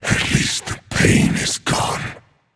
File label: death; smite; khepri